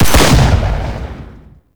Shotgun Shot; Tenno; Alchemist

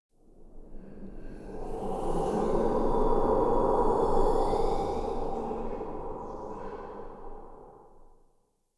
Amb Corrupted Wind Gust; Ambience; Mendicant Area

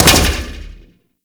Grenade Bounce; Warframe; Weapons; Corpus

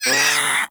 Feral Vocal Pain I; Warframe; Catbrow

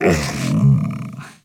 Kubrow Tame Interact Affection Closed Mouth

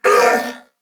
Pain, Male; Corpus Venus; Warframe; CorpusVenusMaleA

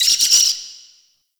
Interior Spider Chatter; Ambience; Warframe; Duviri; Murmur

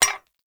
Ferro Pillar Impact Hit; Gameplay; Warframe; Tau; Destructible Dynamic

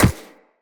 Walk Ice, Footsteps; Operator

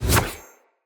Sword Whoosh Heavy; Weapons; Player; Swords; Fey Sword

